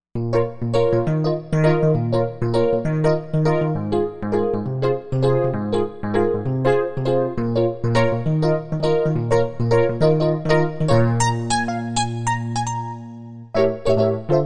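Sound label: piano Rhodes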